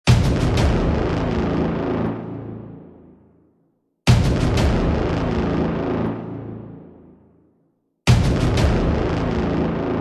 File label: Brutal Hybrid Trailer